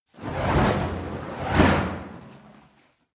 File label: fire torch whoosh flutter long; swooshes-swishes